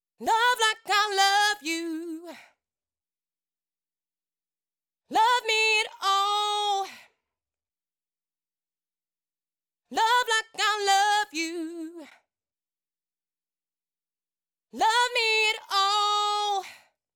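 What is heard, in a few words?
Chorus Response Melody Female Vocal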